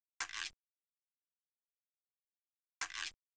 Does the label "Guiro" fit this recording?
Yes